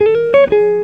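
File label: electric guitar melody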